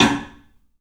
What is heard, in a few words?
PABRSN, 3D Drums, CLUB X STICK; 3D Sound